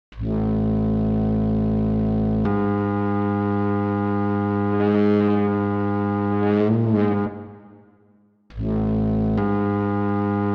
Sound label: Old Sax free jazz